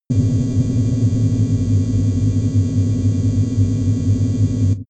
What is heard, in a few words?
space alien machinery